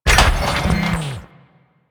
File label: Garage Door Open; Ambience; Warframe; Venus Open World